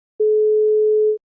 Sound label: calling